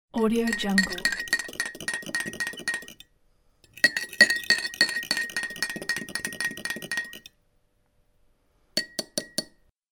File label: mixing tea in mug; fxprosound